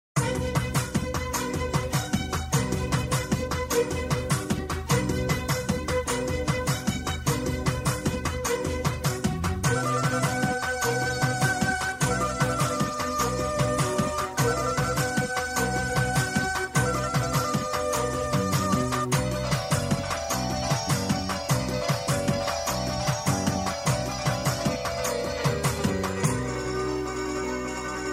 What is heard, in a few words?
Hindu Devotional Karaoke